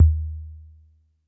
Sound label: Analog Tom, Yamaha PSR-E323